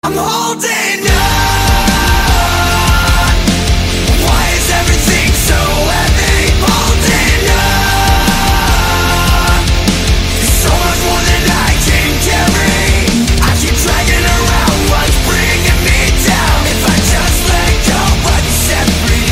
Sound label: Heavy Rock Cover